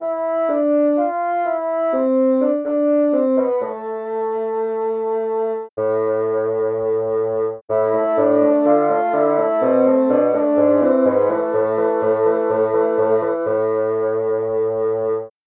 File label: bassoon